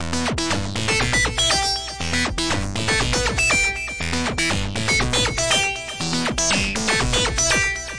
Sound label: 120 echoey-distortosynth-melody, synth and electronic loops